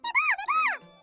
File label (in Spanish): enemigo; skibiditoiletattack